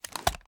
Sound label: reload empty xxmag fast start; gmod; iw8; uzulu